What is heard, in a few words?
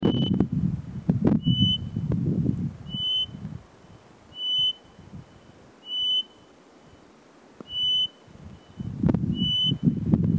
black storm petrel